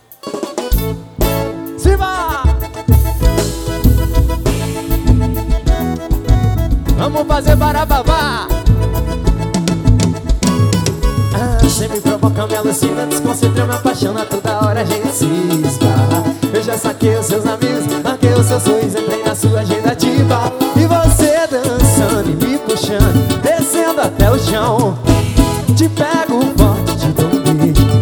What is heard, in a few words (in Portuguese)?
banda ao vivo; no sancho music